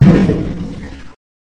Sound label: Torpedo Impact